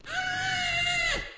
Shitting small shit; Tom

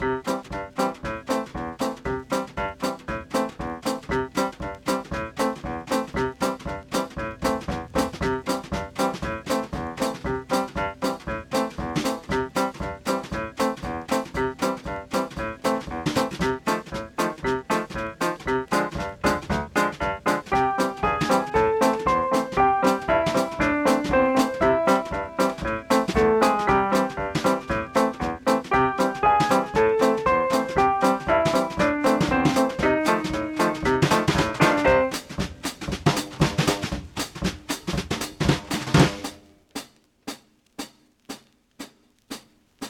survival music piano; Pix the Cat

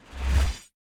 Teleport Appear; Super Mombo Quest

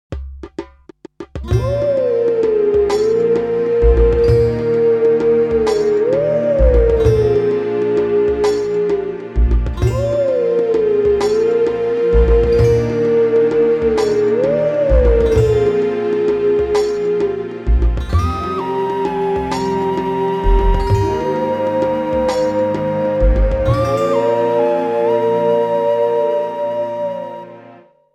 Howl Song (Duo); the legend of zelda twilight princess; Song of Heroes

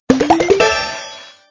Box blow

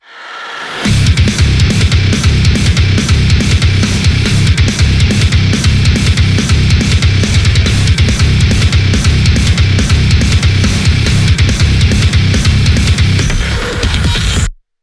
Metal Hell Main Heavy

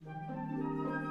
Music Intro Main 108bpm

